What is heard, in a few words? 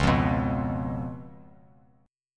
Db 7th Db down; Db7 strummed; JGuitar